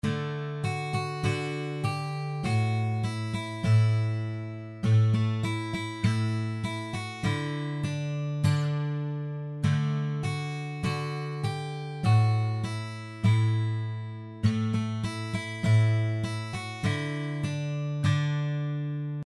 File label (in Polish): Hush Little Baby Gitara; gitarowe tabulatura